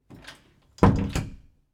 Door Closing

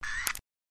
camera flash

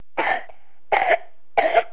people

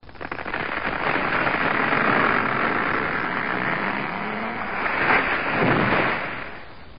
tree falling in woods

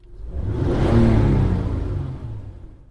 engine rev inside

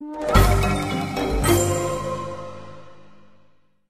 ninja tone ringtones

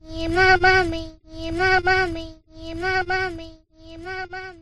spooky

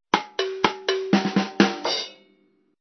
CARTOON DRUMS

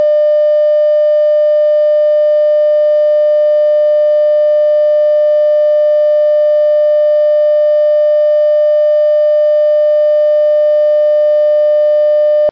tv off air

